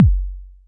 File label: New V-kick, EMU Orbit-3 drum machine; 300 Drum Machines